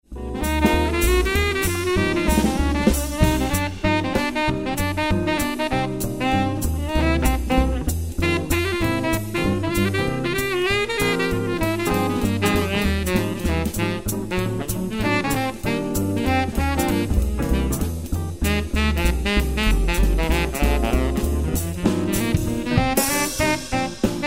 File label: sax